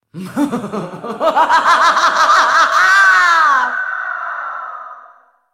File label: laugh; Black Ice Anivia